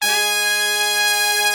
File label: BRS, R&R Horns; Roland - Brass, Strings, Hits and Combos